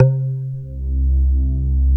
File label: WOOD FX; Back In Time Records; Big Bang; SFX